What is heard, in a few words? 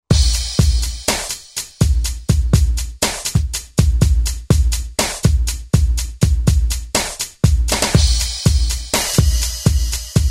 Pop-R&B Kit, Korg TR-Rack